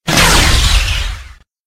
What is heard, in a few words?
lightning short; vfx